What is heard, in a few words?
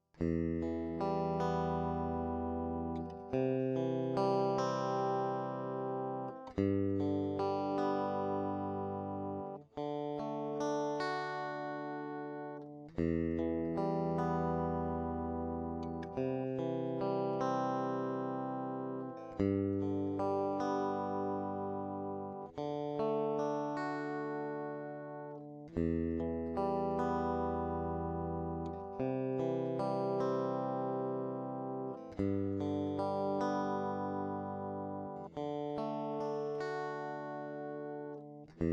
strat